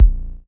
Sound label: Kick; Samplepack Produtique